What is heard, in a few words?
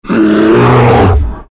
bat